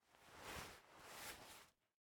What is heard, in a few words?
Take Knife; Game rip; MiSide